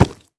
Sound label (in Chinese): 猪灵脚步声